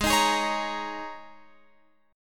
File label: G sharp Suspended 2nd Suspended 4th, G sharp, down, Guitar, Open F; strummed